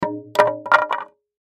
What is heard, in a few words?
Impact Wood Drop Plank Fall; Sound Effects:Materials; Blastwave